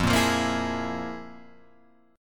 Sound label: F Minor Major 7th Double Flat 5th